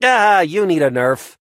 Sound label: hurt vo